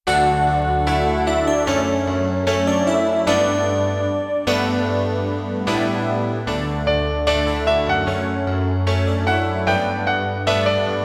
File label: Yuunagi Instrumental; Neko Sound Collection; Lamune